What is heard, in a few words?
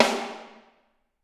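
snare drum, Drums; Bob Clearmountain Drums I; SD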